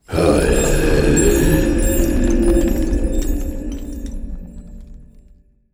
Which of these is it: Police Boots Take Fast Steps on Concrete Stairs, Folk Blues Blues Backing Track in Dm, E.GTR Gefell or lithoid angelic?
lithoid angelic